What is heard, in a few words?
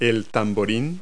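tambourine; Multi-Lingual Talking Picture Dictionary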